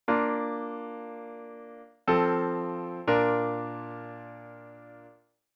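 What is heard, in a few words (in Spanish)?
Cadencia Auténtica Perfecta V a I, Bb; auditivo de cadencias autenticas plagales rotas y semicadencias; Identifica la cadencia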